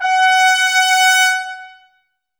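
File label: trumpets F#, brass; Miroslav Vitous; Woodwind Brass Ensemble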